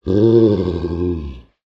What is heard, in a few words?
horror monster creature grunt